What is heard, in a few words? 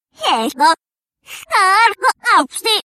clock, reverse